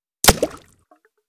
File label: Bullet Impacts Water